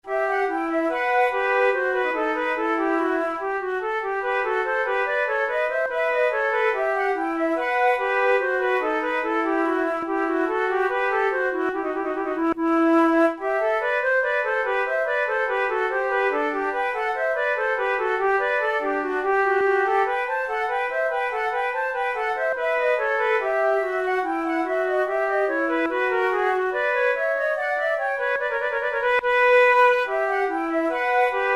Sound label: flute